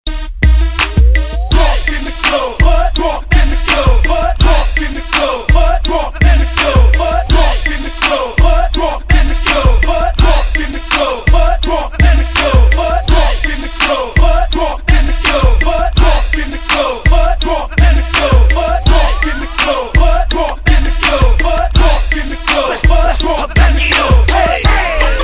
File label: Hype Intro